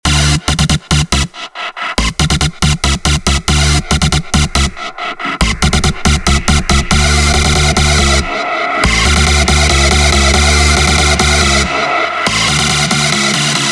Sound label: Bass Melody 140BPM Dmin